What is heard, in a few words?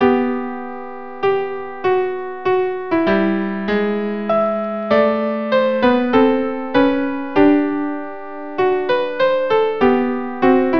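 Panis angelicis violin viola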